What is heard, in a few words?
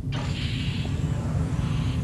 Door Open; trek